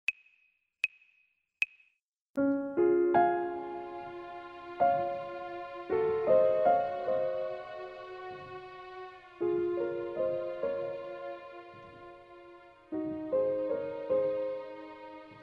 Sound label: Clair de lune ORCH